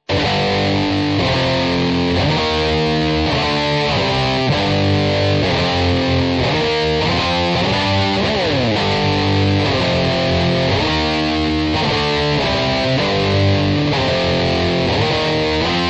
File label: Guitar